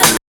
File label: fx-percussion, Techno; Techno ID; single-drums